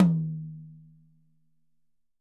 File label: YAM 8X10, Drums; Big Fish Audio; Ross Garfield Drums VOL-2